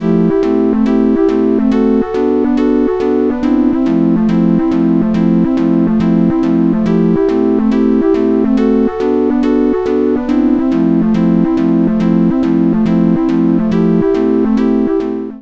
Behringer Neutron Chords Paraphonic Delay

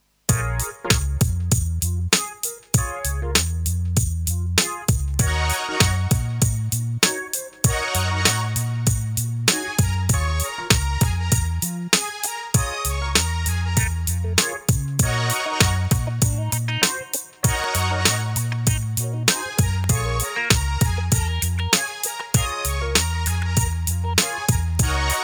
Behringer Solina Rhythm and Blues

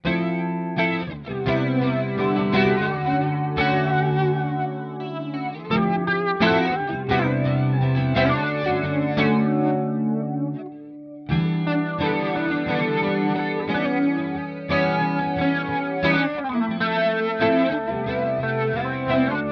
Walrus Audio Polychrome

